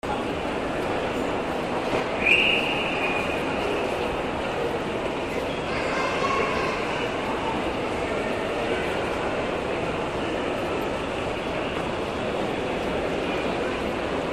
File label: atmo holidays shopping mall